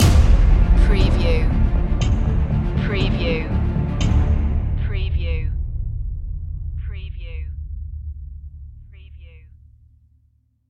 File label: the showdown trailer stinger